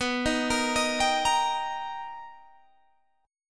B Augmented Major 7th B Guitar Open G, chord

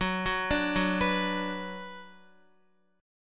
F sharp Suspended 4th Sharp 5th, Guitar; arpeggiated; x,9,6,7,6,7; down; 4F,4A,5C,5G,5C,6E

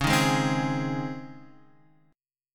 C sharp Major 7th Suspended 2nd C sharp; strummed; chord; x,4,1,1,1,4

